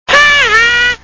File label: haha; simpson